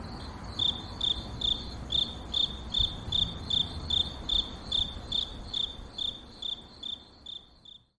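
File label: crickets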